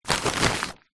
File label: foley paper sheets handful pick up grab